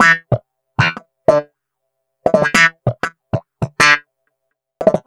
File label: Wah Snap in E, Snap Bass; Basses